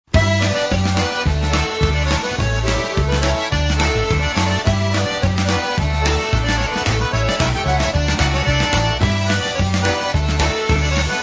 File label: GETTING OLD POLKA